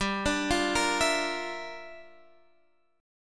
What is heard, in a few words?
G Minor 13th G down Guitar Half Step Down